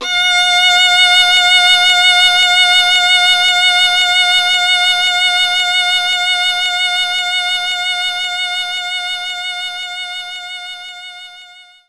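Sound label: Violin F#5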